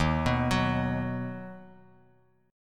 D sharp Minor Sharp 5th D sharp down Guitar Open D